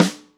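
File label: snare, EMU Orbit-3 drum machine; 300 Drum Machines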